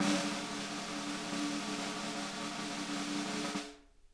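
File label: snare roll; Sonic Reality; Interactive Drum Kits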